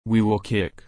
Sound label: kick